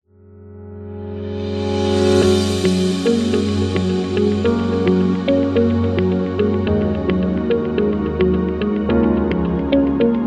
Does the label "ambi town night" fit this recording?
No